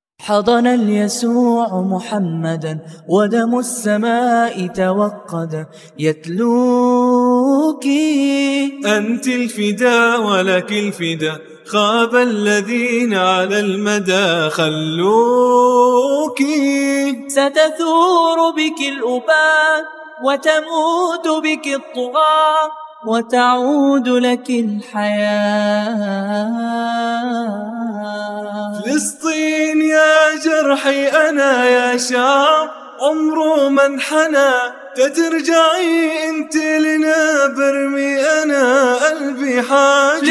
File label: Vocals Only, nasheeds